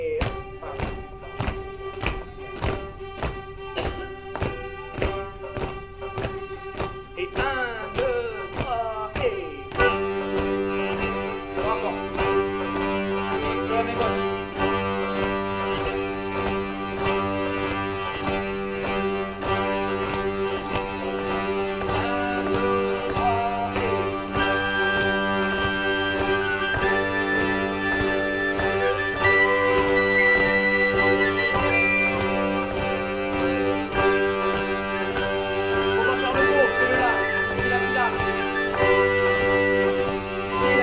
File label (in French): Rondeau pont rythmique avec bourdon diatos violons flutes et mélodie avec maintien bourdon; sol diato; Quand son paire l-a maridada